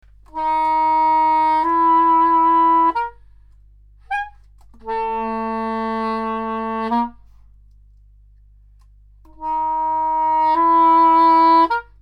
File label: soprano saxophone